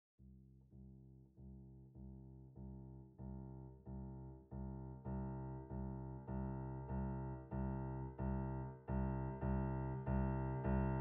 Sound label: vsl fazioli test